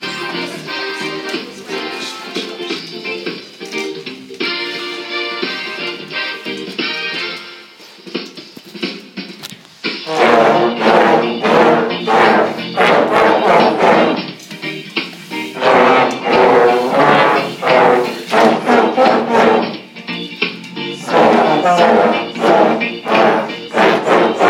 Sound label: brass reggae